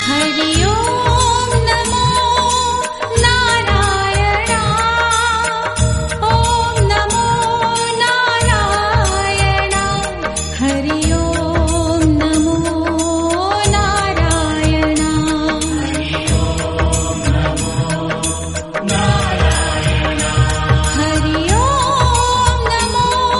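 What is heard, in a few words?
Om Namo Narayana Ringtone Female Version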